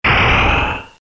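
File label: magic hit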